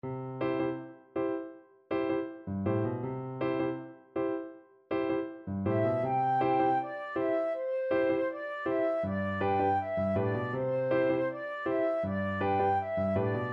flute

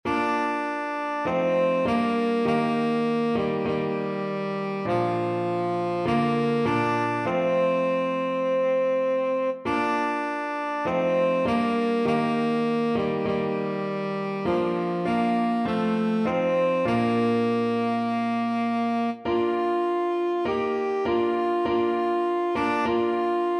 nearer my god, tenor saxophone

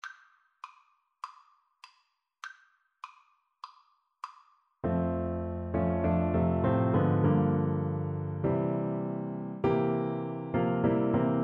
just a closer walk, Bassoon

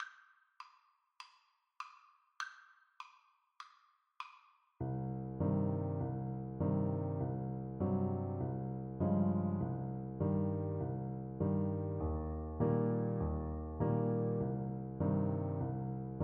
Piano Duet

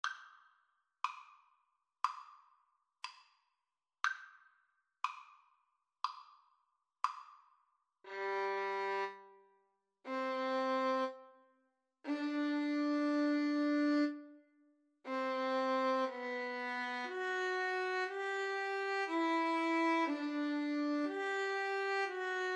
romance, Violin Flute Duet

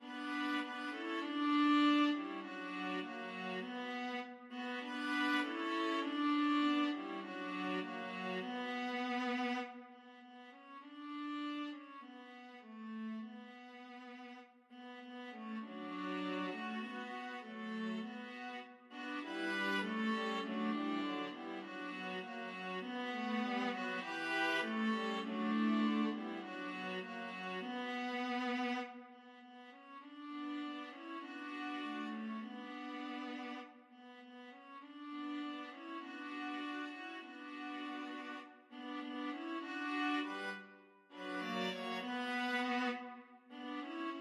viola trio; crown roses

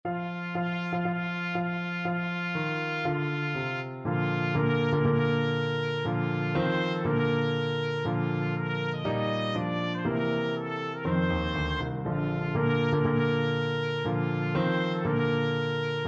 wedding march, trumpet; wagner wedding march

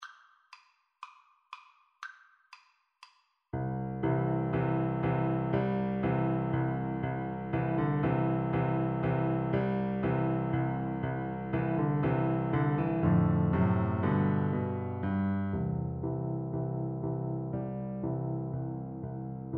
piano duet

